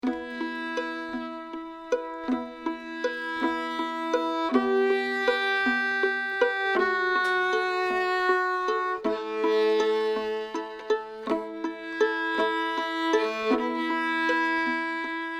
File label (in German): einfache Begleitung; mit Chordstacknoten